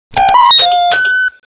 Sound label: Key Seq